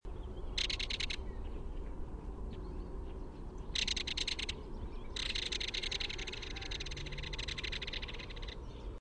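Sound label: rattle, Belted Kingfisher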